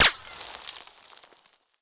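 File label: ricochet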